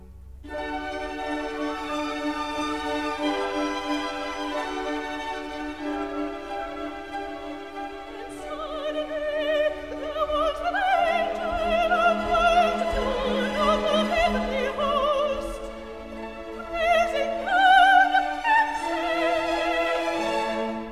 Recit (Soprano); Messiah

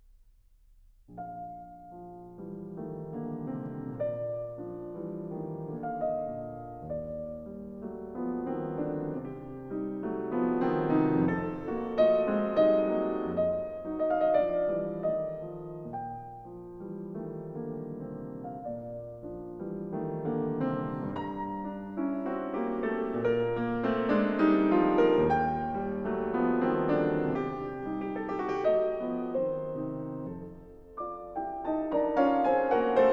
B-flat major: Cantabile, piano; 24 Préludes, op. 28 no. 21

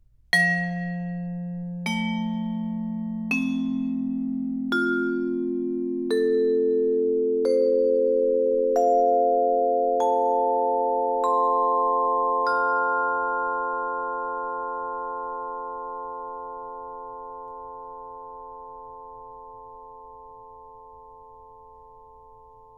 Converter Resolution Tests Odb Dither

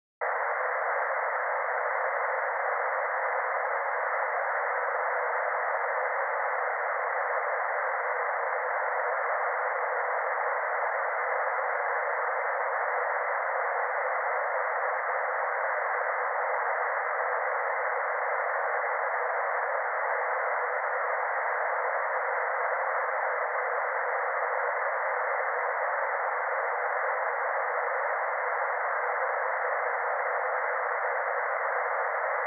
Reference Tones Right-channel level-match tone; Surround Spectacular

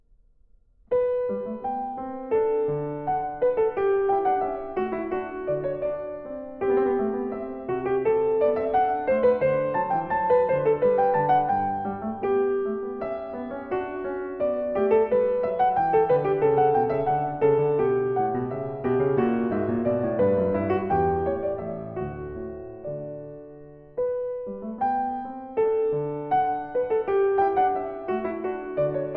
Variations, for keyboard

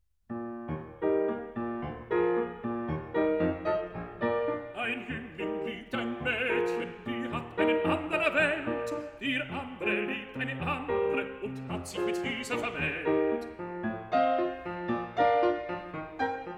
song cycle for voice & piano; Dichterliebe; Schumann

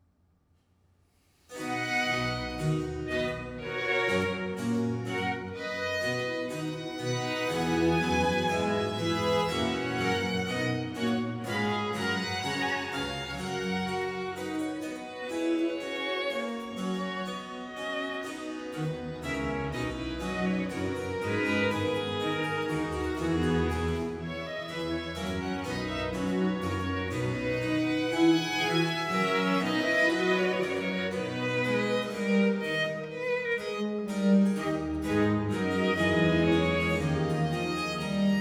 Concerto Grosso in C major; Corelli

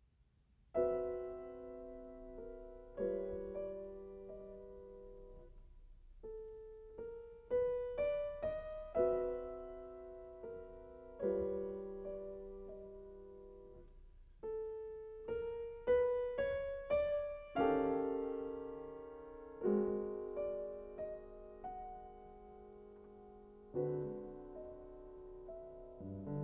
Piano Sonata in B flat major; Piano Sonata No. 7 in B flat major ("War Sonata 2/Stalingrad"), Op. 83

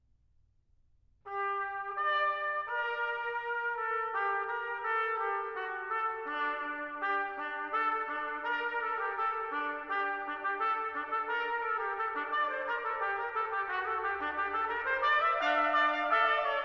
Fugue for organ in G minor; BWV 578 (BC J66); Bach for Babies; Fun and Games for Budding Brains

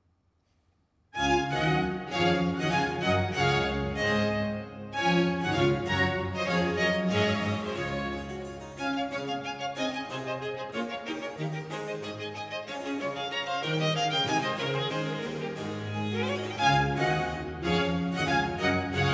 Concerto Grosso in D major; Corelli

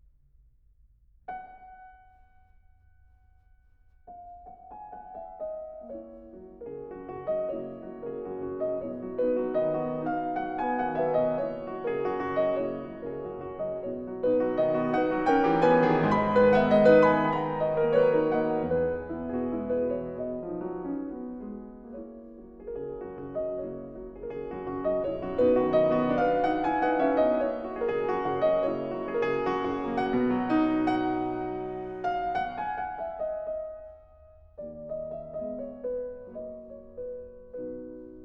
B major: Vivace, piano; 24 Préludes, op. 28 no. 11

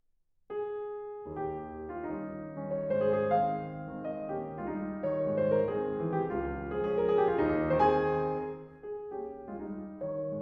Mazurka for piano in A flat major; Chopin

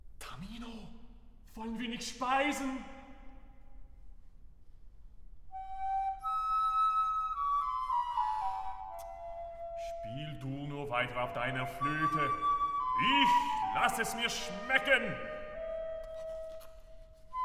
opera, Duett; (The Magic Flute), K. 620; Act 1. No. 7.; Mozart; Die Zauberflöte

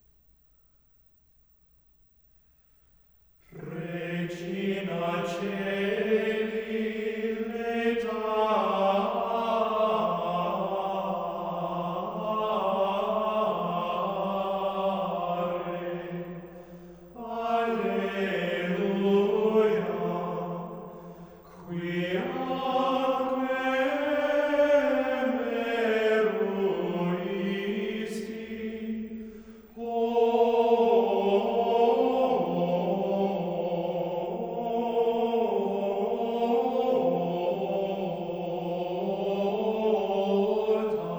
antiphon in mode 6, Chants

